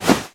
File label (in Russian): molnienosnij korotkij polyot bejsbolnogo myacha